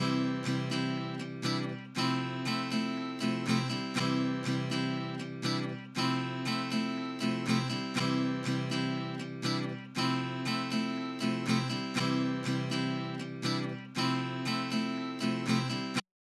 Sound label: acoustic guitar